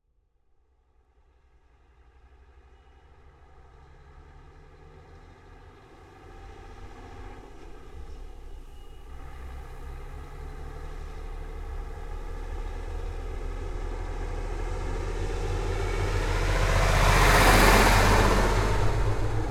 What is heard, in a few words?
Truck Ural Driveby Close Perspective, URAL 4320 Diesel; FLYSOUND